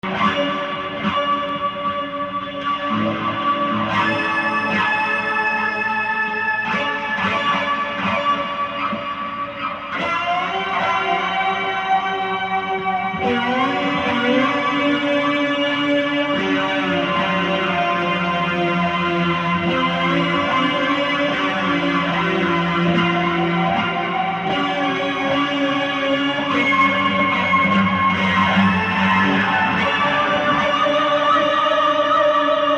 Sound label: harmonizer